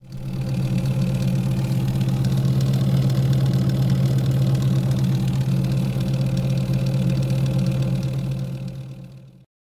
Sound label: diesel car; vehicles; NS Studios - ultra sound collection